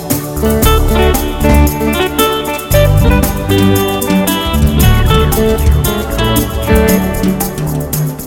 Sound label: Happy and Jazzy